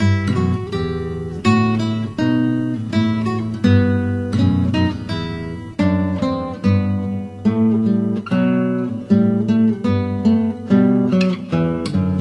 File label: bourree, gitarre